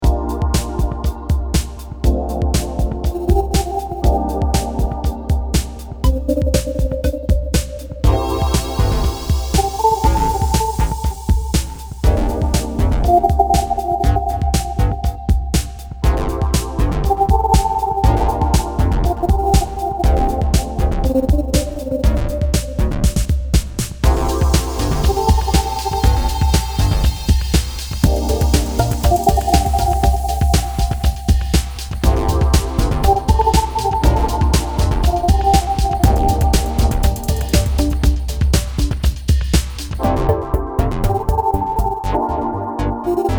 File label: Cat Waves